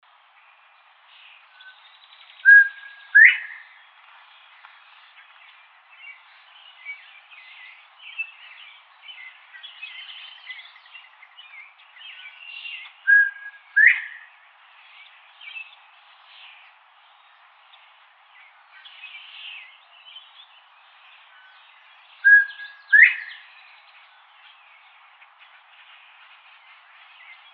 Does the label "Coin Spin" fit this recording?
No